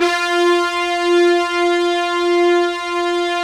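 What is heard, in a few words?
synth brass f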